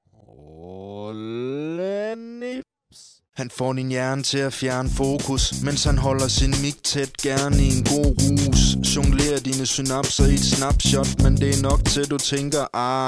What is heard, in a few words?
adult groove